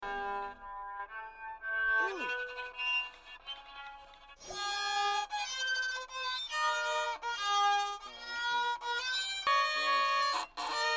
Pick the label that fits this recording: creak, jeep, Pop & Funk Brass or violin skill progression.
violin skill progression